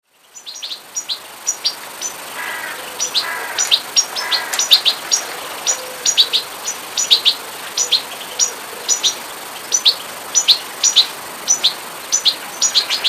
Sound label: goldfinch